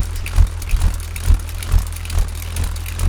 BULLROARER, Primitive Instruments

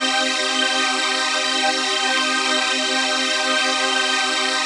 PHASE PAD

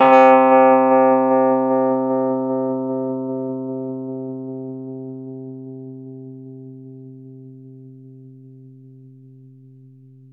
Rhodes 2C; 3D Sound; 3D Keys VOL-1

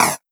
Criket Metal, Esoterik Beatz; Criket FX; Cricket

